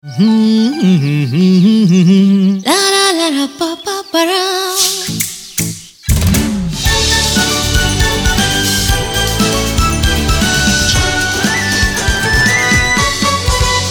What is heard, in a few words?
ringtone good morning